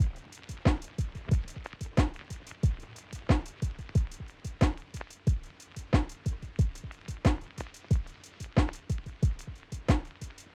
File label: 91bpm vinyl